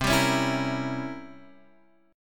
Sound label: C 7th Flat 9th C Guitar Open G